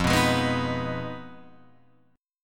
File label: F Minor 7th Flat 5th, Guitar, Open F; Fm7b5; strummed; 5,6,8,6,6,6